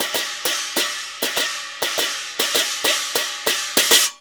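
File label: CHINA, China cymbal; Spectrasonics; Heart of Asia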